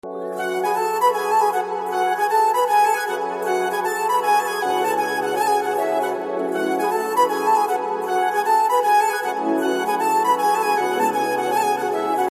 Flute BGM Ringtone; Miss India; Telugu

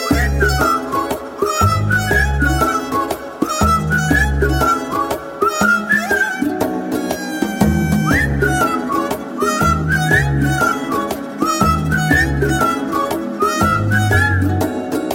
Whistle Tone; Premam; Telugu

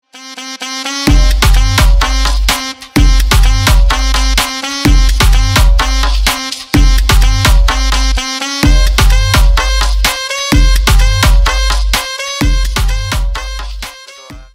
Instrumental Ringtone

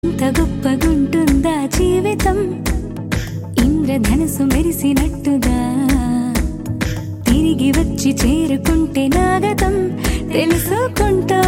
Love Ringtone; Oh Baby; Telugu

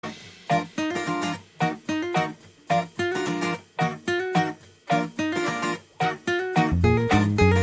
BGM, Telugu; Taxiwaala

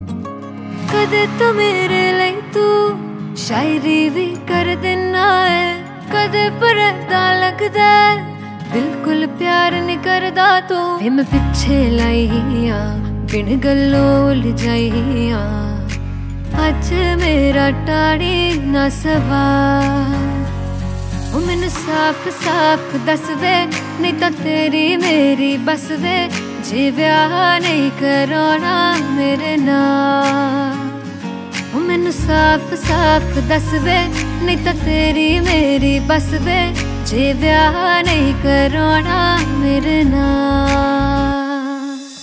Female Version, Punjabi

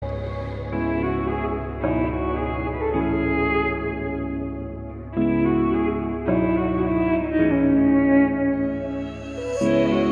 Instrumental

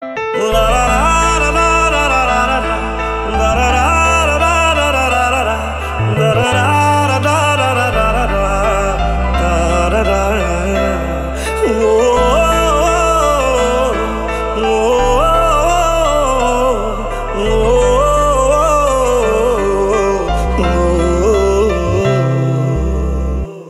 Ringtone Humming

